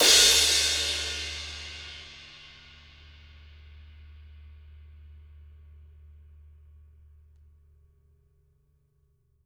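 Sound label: cymbal 16 + 18 crash, jazz crashes, Living Drums; Sampleheads; Peter Erskine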